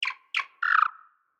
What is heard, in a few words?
world tree birds day; Factorio